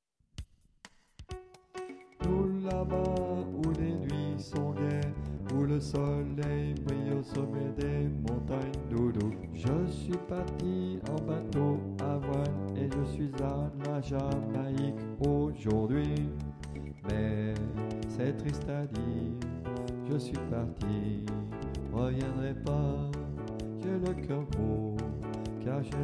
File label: alto